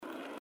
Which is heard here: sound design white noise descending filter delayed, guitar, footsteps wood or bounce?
bounce